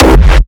Raw Kick; Kick Compact Pack Vol.1; raw hardstyle kicks

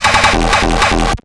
Kick FX Roll, raw hardstyle kick; raw hardstyle kick pack 1

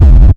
Kick Rumble, Raw-Hardstyle; raw hardstyle kick pack 1

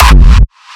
Gated Kick, raw hardstyle kick; raw hardstyle kick pack 1

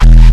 Kick Tail E, raw hardstyle kick; raw hardstyle kick pack 1

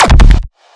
Strange Kick, raw hardstyle kick; raw hardstyle kick pack 1

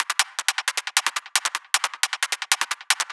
Rhythmic Loop 155 BPM, raw hardstyle kick; raw hardstyle kick pack 1

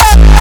Raw Kick, hardstyle; raw hardstyle kick pack 1

